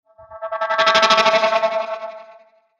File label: Chopper Filter Buzz Swell; Blastwave; Chopper Sound Effects